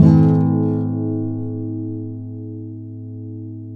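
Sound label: nylon guitar down minor A#, flam chords; Spectrasonics; Hans Zimmer Guitars VOL-2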